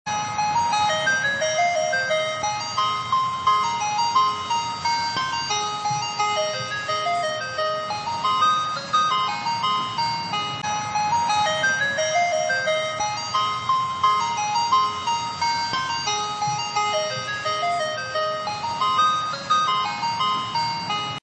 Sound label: ice cream truck musical car horn